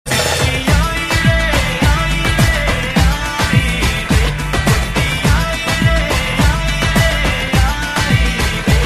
Sms Tone

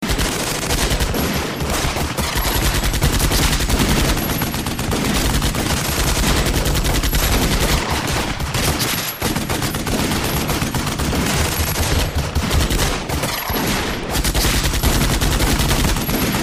Weapon Testing

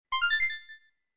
Bomb Pickup; Starfox

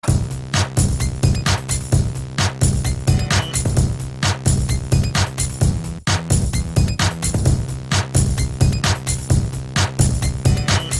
Hip-hop

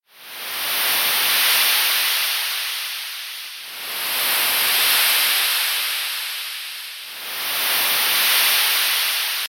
Detox frequency

